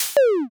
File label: delete